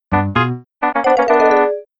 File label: Lose; Nekketsu Koukou Sound Club